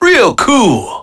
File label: Alarm Clock (shut off); Jimmy T